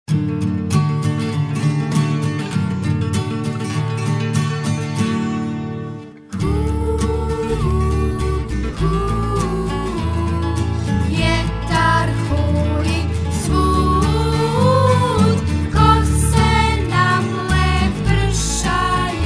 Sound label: hu hoj huh